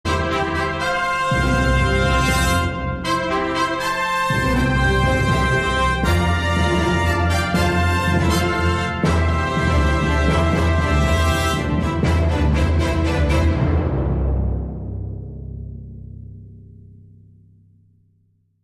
Worldwide Fanfare

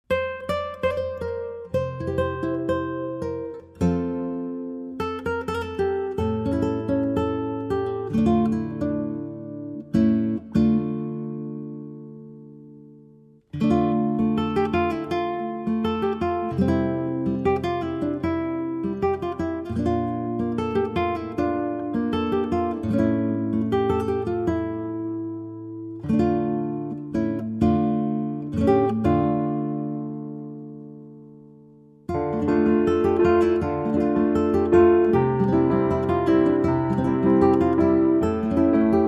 Reverb Guitar

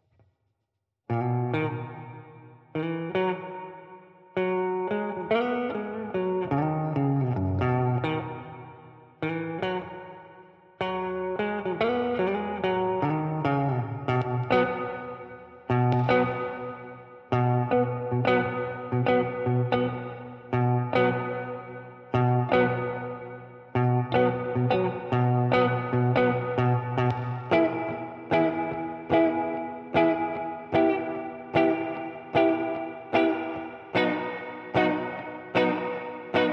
Guitar

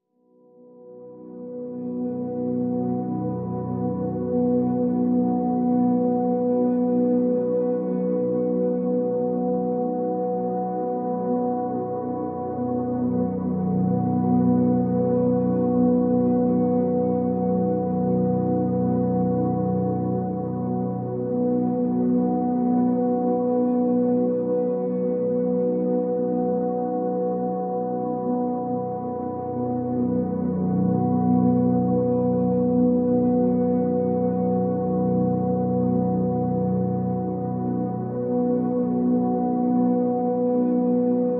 Pads (B)

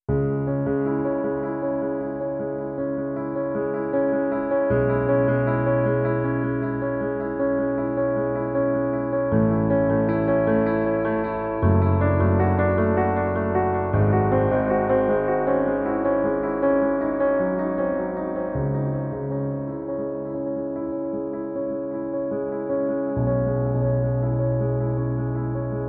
Moonlight, Piano

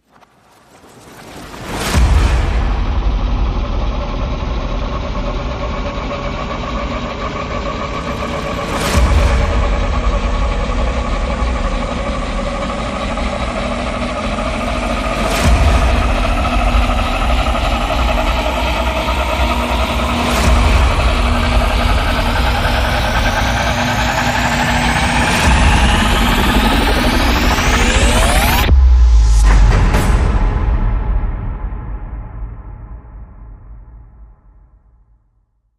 Rise Complex Hydro Pressure

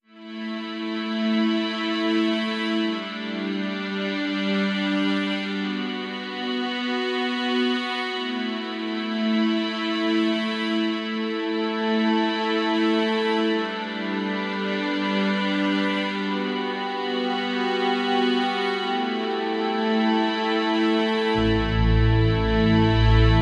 Ambient

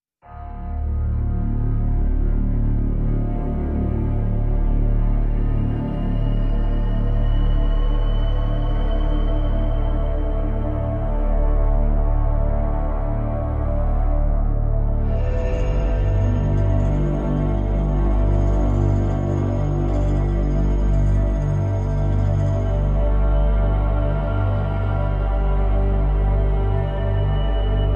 Drones Only

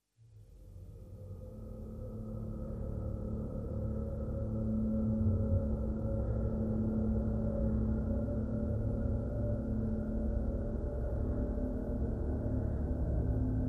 Drones Only

